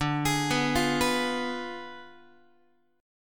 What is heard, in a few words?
D Diminished 7th, D, down, Guitar; x,10,11,9,9,10; Ddim7 arpeggiated